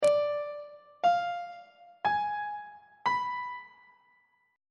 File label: e flat harmonic minor 7th chord 7 is d diminished 7th on treble clef